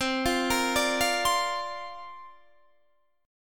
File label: C 9th Suspended 4th C down Guitar Open D